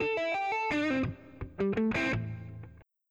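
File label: Guitar Input Dry mono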